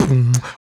Human Perc, Body Perc

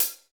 CYM X14, Naturals; Club-50 - Foundations Roland; Natural 10

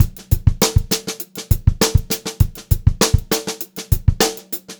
VAR, Funk-Groove Drumloops

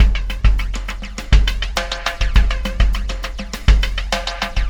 Oscillation Beat

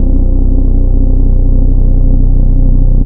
BASS, Bass Analog & Synth; SUCK Y; USB Soundscan vol.12; AKAI

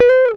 GTR TRIK, Jazzistic; Univers Sons

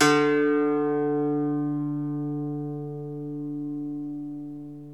IRISH HARP

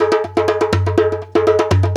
Dumbeck, Percussion; Sonic Foundry (Sony Creative Software); World Pop

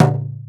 LOG, INDIAN PERC

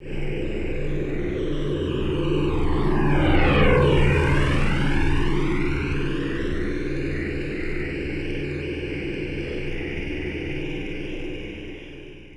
PROP; AKAI Anime Sound FX; NATURE

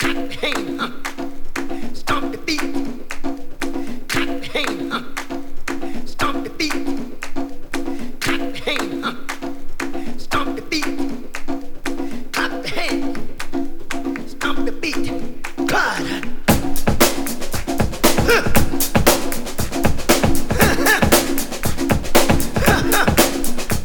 116.5 Turnip Jungle, Breaks; Lockdown Breaks

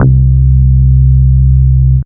Single Electric Basses, Dance Floor Bass

